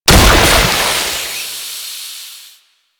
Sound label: Purification Machine Explosion; Warframe; Nineteeen Ninety Nine; Infested Survival